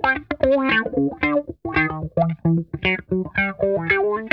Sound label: RISE; Zero G - Funk Guitar